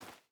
footstep boots dirt jump start; Rust

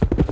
Horse Run; Civ4 warlords

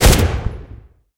mg 13mm dshk lastshot, guns tanks; War Thunder

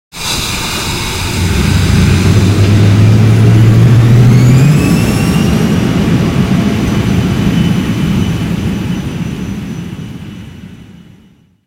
me163 start, engines; War Thunder

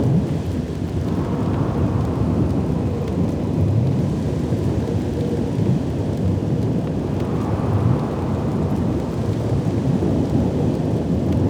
emitter fissure

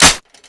reload hit, foley; m60

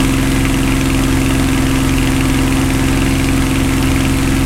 spitfire cockpit, engines; War Thunder

